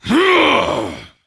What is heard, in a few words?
Attack Heavy; Creature; Varimathras